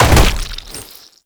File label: Land Heavy Goo, movement; Warframe; Uriel